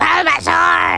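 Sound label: bulbas, Pokemon crys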